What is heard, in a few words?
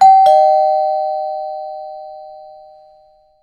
doorbell